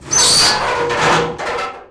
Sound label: sign noise; free 2 play